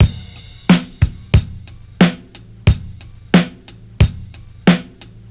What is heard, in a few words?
90 bpm breakbeat sample G sharp key; drum loops